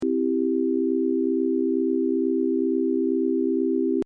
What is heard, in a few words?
hummmmmmmmmmmmmmmmmmm